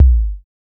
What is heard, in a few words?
ANALOG K SH, HOUSE KIT; Drums In Your FACE!